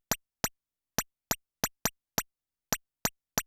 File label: GLASS DROP, Techno 138 BPM; Best Service ProSamples vol.54; AKAI; FALLING HI